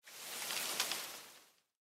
Amoeba Tree; Blind Quest - The Frost Demon

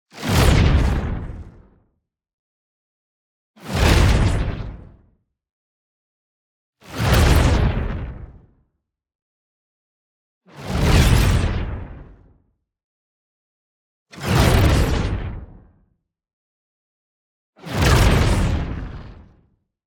WHOOSH MEDIUM Energy Shockwave; boom library; Matter

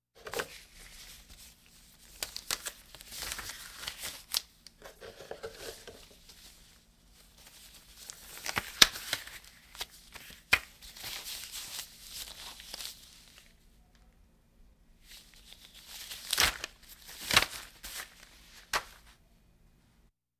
Hospital effects

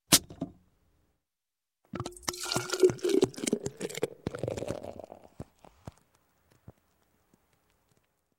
Bottle, Beer Open, twist Cap, Pour; Sound Ideas 2000 Series

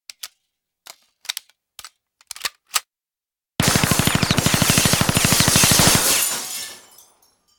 Gun, Machine, Load And Fire Through Glass; gun and weapon sounds; captain audio; fully loaded

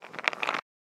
Bones

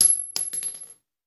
Bullet Shell; Sound Ideas; Guns

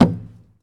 Baseball Hit Wall

